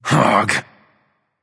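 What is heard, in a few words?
nonverbal ugh; smite; voicepack; merlin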